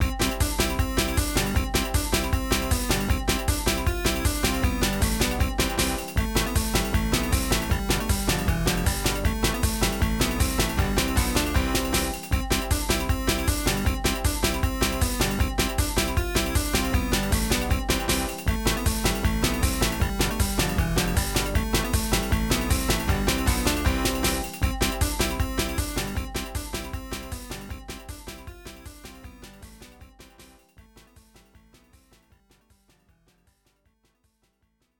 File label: Bonus Stage BGM, arcade; Wally wo Sagase; System 18